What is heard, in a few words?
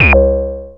Anasound Short mid C, Industrial Analog Single Notes; Sonic Foundry (Sony Creative Software)